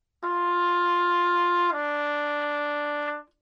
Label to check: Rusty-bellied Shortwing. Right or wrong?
Wrong